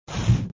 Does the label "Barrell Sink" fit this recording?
Yes